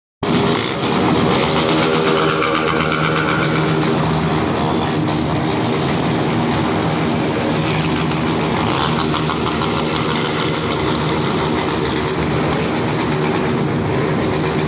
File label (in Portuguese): Motos barulhentas